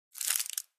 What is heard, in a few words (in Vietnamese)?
Âm thanh xóa chuyển thùng rác